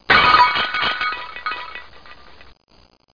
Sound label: GLASS; Talking E-Mail